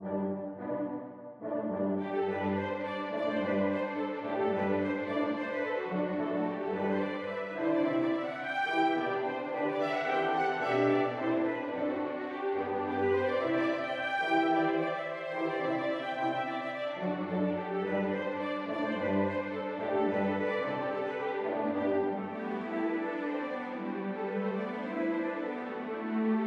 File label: square dance; interludes